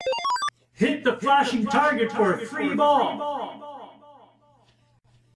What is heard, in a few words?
hit freeball target, pinball sound effects